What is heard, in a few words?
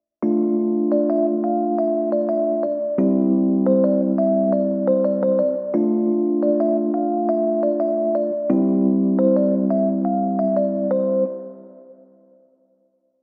yamaha reface cp rd1